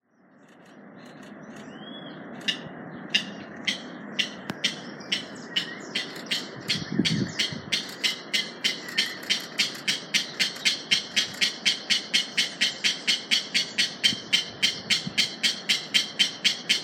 Mangrove rail; پرنده یلوه حرا

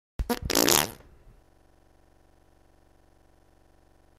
Toothpaste, خمیر دندان

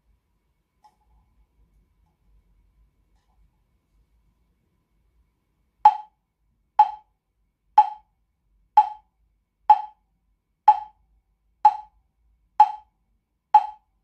Wooden Fish

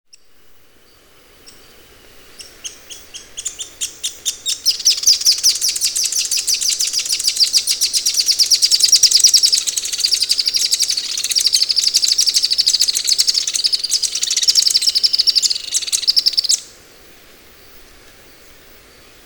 Pink legged graveteiro; پرنده گورمرغ پا صورتی

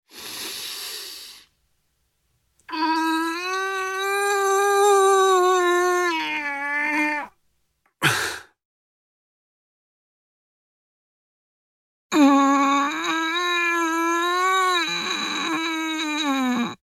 man sighs

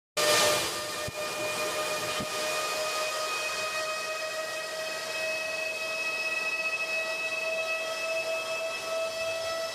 bitcoin miner

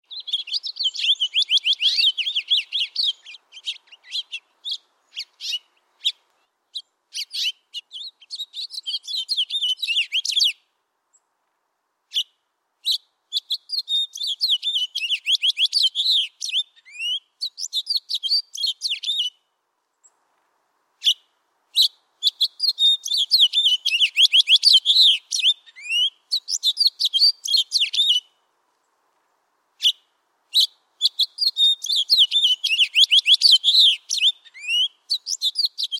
house finch sound

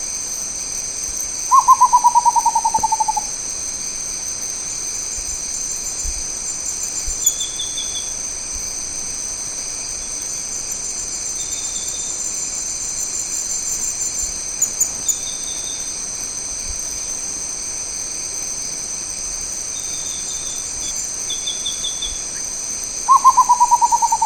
Rufous potoo

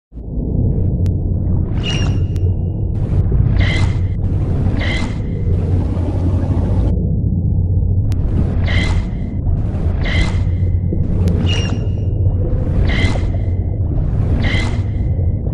Dugong

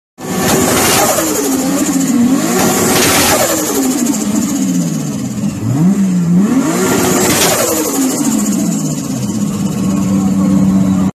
Toyota Supra

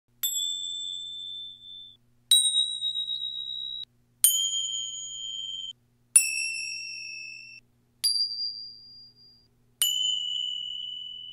finger cymbals

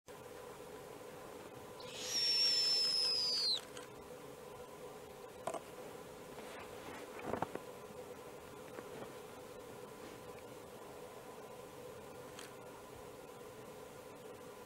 snowy owl sound

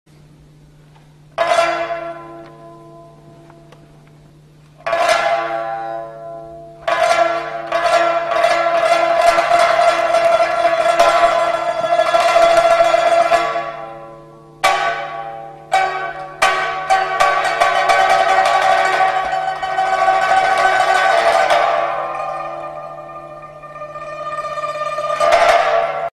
Biwa; Nakime; Kimetsu; ساز بیوا

